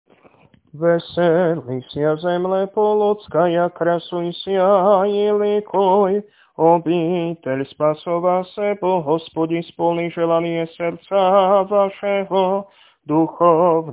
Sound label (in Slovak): Tropár hlas; Tropár Eufrozine, hlas 3; nedelna-skola